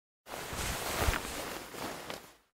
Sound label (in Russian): zakutalsya v pled